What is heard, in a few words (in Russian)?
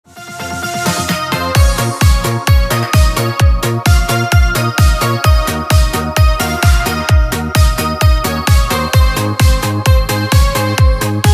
клубный хит; клубняк